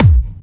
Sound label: Clubby Kicks, Bassdrums